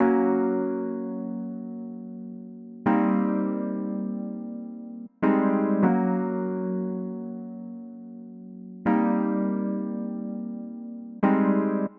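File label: 080 Chill Pop E-Piano F major; Pop Rock; E-PianoClav; Pro Tools Essential Loops; Digidesign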